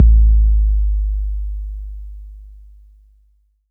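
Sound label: bass drum 808 Tape Decay F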